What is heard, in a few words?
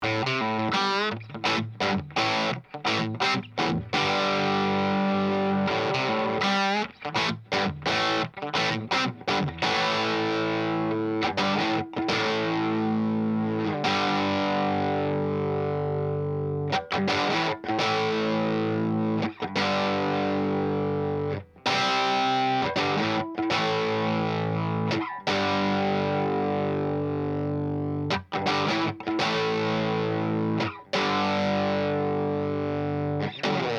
Marshall SV20H jumped channels 90s rawk